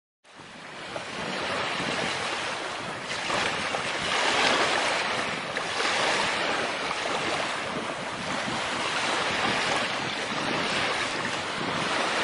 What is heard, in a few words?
il rumore bianco del mare white sounds of the sea